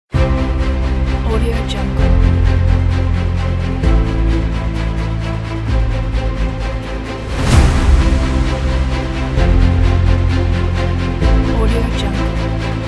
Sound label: the epic corporate